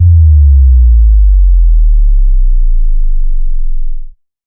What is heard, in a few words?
Sub Drop